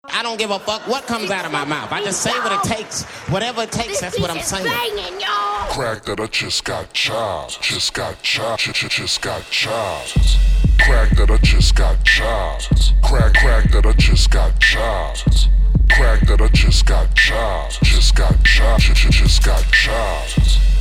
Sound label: Instrumental